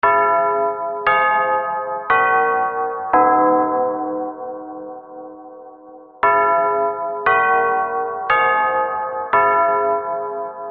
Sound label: clock westminster